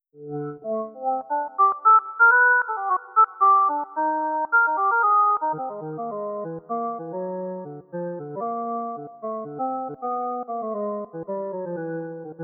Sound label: organ